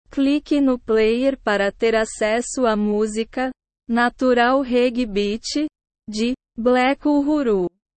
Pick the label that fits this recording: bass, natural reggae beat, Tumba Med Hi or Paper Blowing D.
natural reggae beat